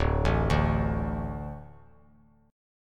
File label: D 5th D, down; D5 arpeggiated; JGuitar